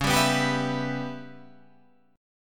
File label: Db Minor 7th Flat 5th Db down Guitar Half Step Down